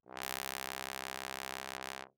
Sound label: Bass Trombone ff C2; MIS Pitches - 2014; Brass